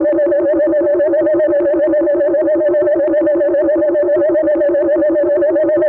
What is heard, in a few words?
Processed Tape delay Loop; radiosonics